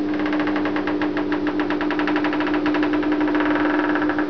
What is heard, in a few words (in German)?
knatter